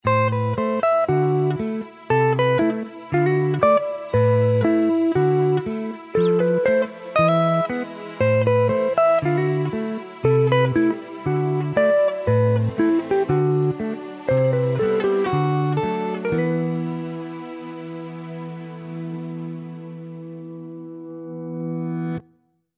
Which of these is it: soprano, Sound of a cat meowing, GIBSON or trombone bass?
GIBSON